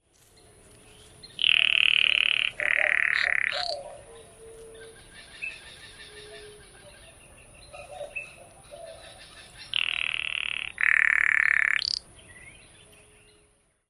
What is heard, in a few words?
rattles